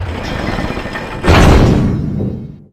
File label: bridge open